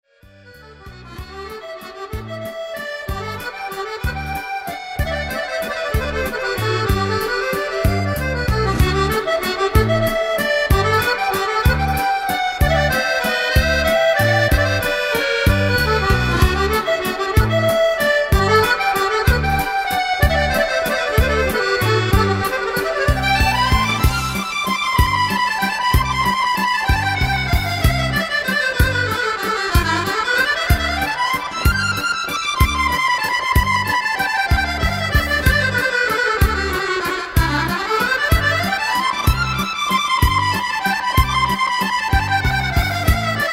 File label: la grande valse, accordion